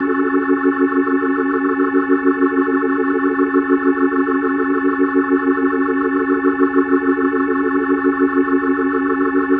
Organ F# Min, Rock Organ Licks

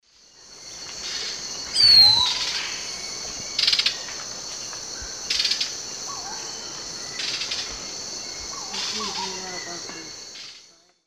Pithys albifrons, song and alarm calls; S. America; Eastern Lowlands

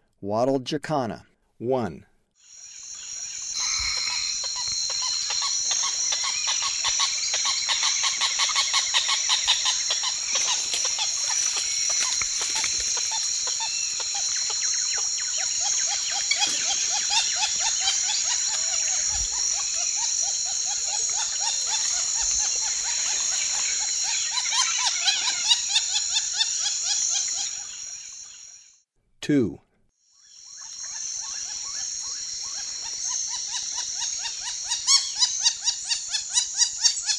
Wattled Jacana, Birds of Ecuador; S. America

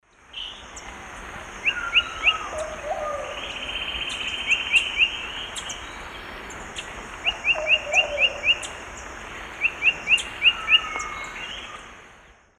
Legatus leucophaius, slow alternate song; S. America; Eastern Lowlands

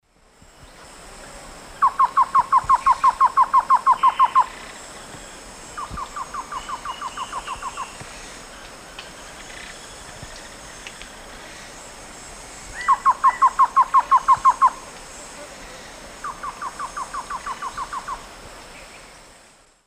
Thamnophilus praecox, duet; S. America; Eastern Lowlands

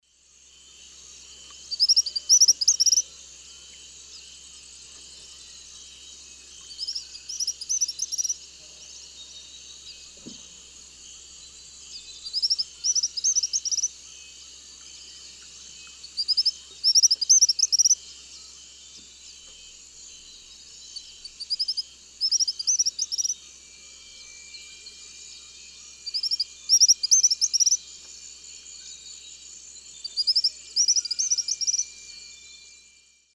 Tyrannus melancholicus, dawn song; S. America; Eastern Lowlands

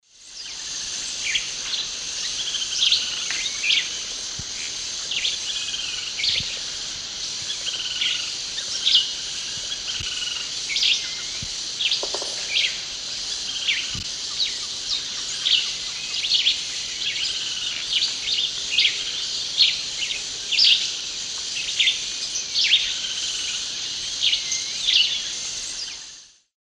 Vireo flavoviridis, song; S. America; Eastern Lowlands

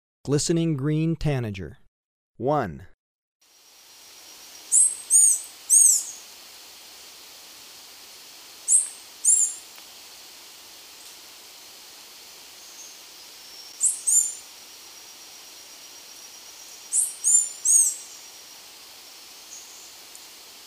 Glistening-green Tanager, Birds of Ecuador; S. America

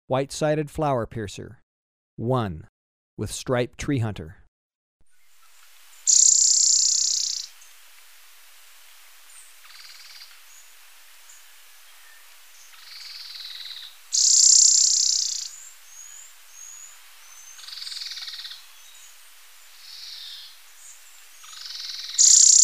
White-sided Flowerpiercer, Birds of Ecuador; S. America

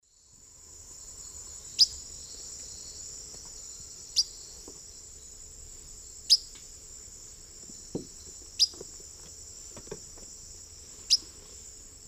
Ramphocelus carbo, calls and flight calls; S. America; Eastern Lowlands

